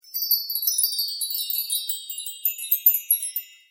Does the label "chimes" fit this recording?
Yes